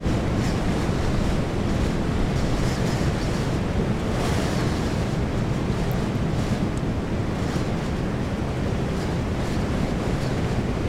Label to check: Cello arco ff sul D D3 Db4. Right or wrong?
Wrong